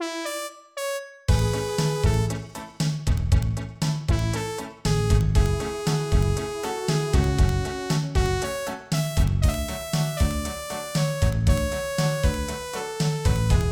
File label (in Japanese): ポップス; rpg tsukuru 4 ps1 2000